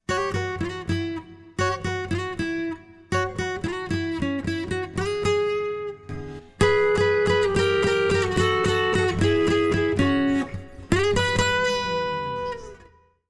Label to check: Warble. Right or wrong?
Wrong